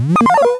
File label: blip blop